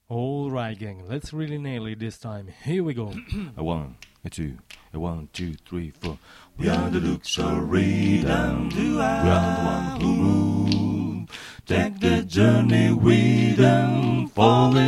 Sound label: All Parts, barbershop